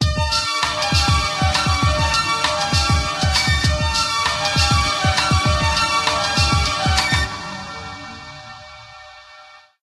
string ensemble; Sony; Xperia Z3; alarms

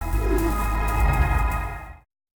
Power Off

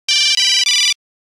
Trio, Nokia Buzzer; Windows Phone apps

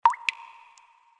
Drop

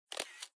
camera click; Sharp; AQUOS sense4 lite SH-RM15

